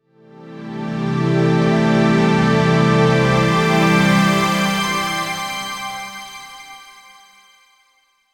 an uplifting ambient pad; vision

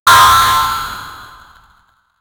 death sfx for a player

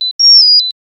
a chimney beep for medica; device

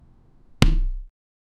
mage ball hit sound; game effects

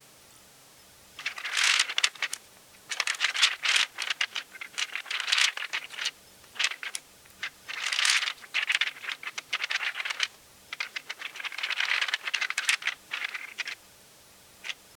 soft rustling of ancient; library

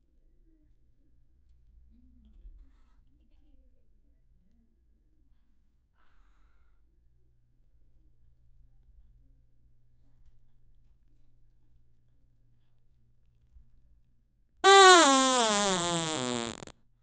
woman farting; burp

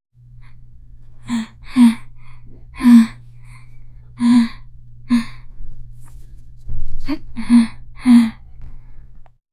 quiet frightened whimper, subdued

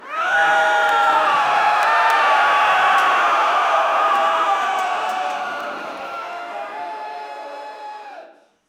ultra realistic crowd panic

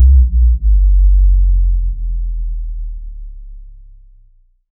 bassy impulse soft

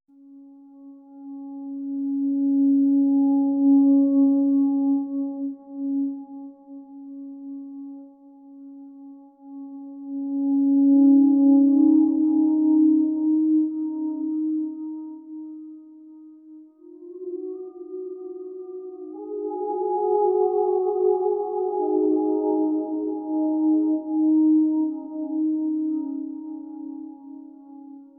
soft flute veena; chant

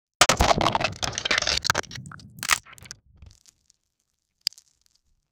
soil breaking, earth; effect

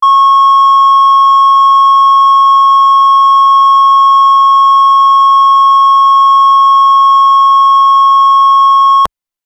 exhaust sound

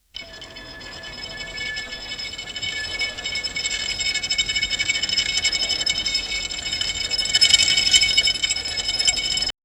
rusty small robot wheel, friction

